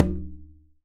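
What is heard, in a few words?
Percussion Conga; Drums; Acoustic and Electric; Polyend; Simulacrum Sounds; 1 Shots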